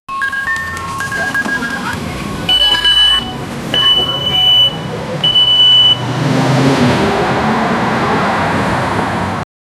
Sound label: urban typical soundscape, bus